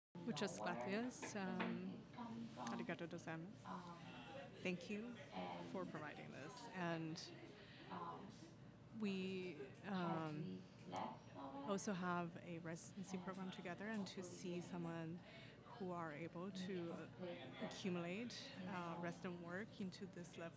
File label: Audience Recording; a-meal-premiere